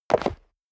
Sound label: step leather shoes concrete jump; schritte